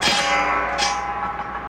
Sawmill Blade Ringi

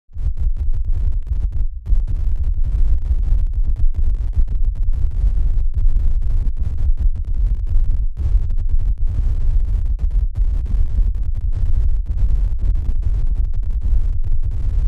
Distortion LF Thumpi

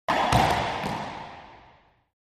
Racquetball Ball, racquetball

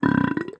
Belches Slow Low Tone; belching

